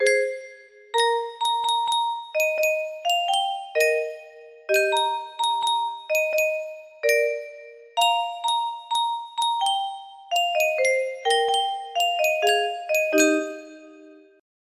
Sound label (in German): yunsheng spieluhr